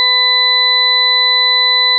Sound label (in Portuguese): 4 senoides